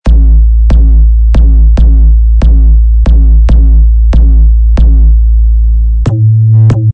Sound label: Loops 808 Loop 140 BPM A